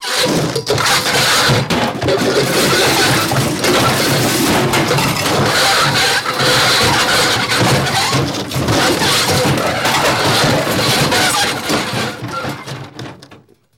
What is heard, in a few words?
Car Hits Drags, car crashes